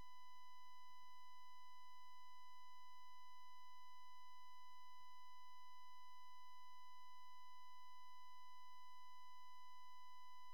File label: Mic 5mV